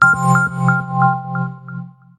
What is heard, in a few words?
Oppo Glittering stock Ringtone